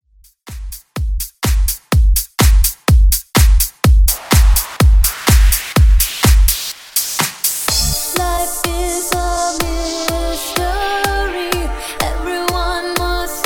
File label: Re Drum Clean 125 bpm